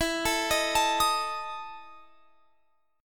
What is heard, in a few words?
E Major 7th Suspended 4th E down